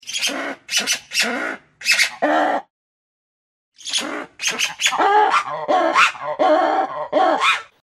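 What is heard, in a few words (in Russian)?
lemur zlitsya, lemur angry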